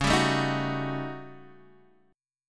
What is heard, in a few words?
Eb 7th Sharp 9th Flat 5th Db